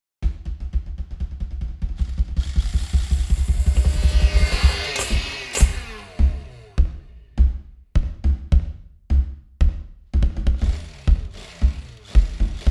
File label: Samba